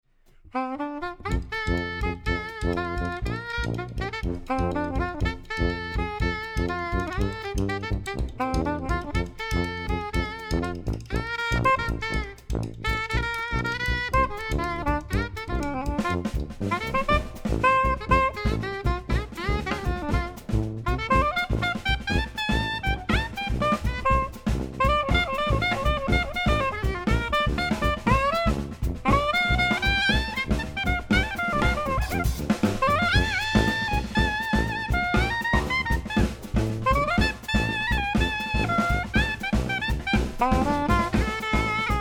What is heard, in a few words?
Trio